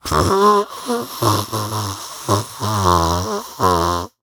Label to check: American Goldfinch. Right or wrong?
Wrong